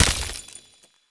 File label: ice camera